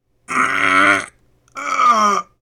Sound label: Grunt